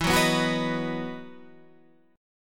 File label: E Major 7th Suspended 2nd, Guitar, Half Step Down; EM7sus2